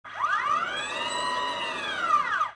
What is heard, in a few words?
CLEAN, industrial